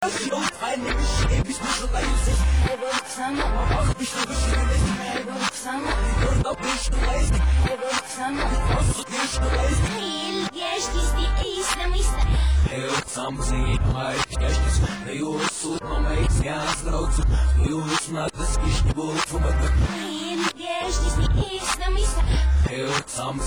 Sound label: arab